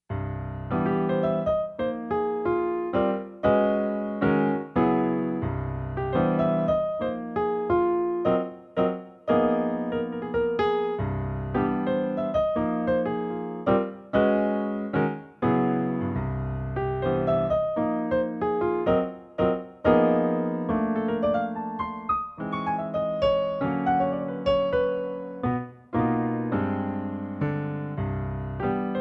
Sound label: blues in c major